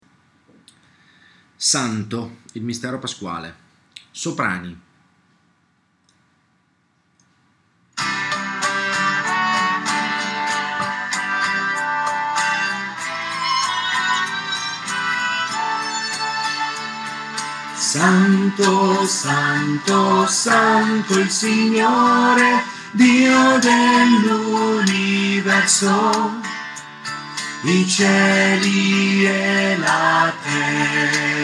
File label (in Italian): SANTO soprani